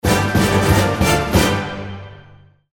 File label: Win Fanfare; Run-and-capture